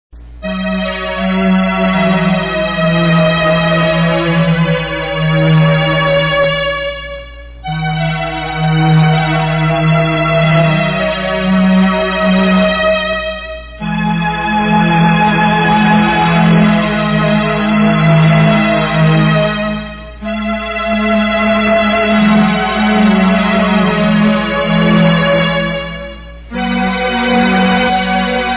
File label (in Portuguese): orgao